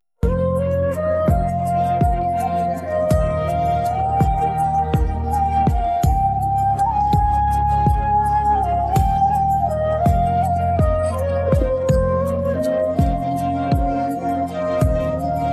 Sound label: no vocals